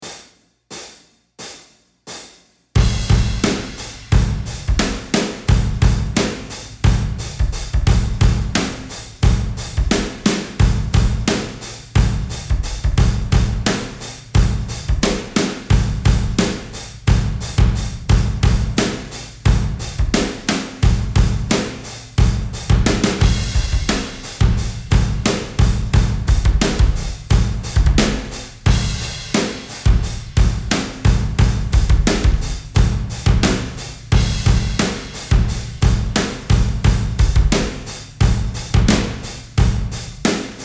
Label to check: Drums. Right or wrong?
Right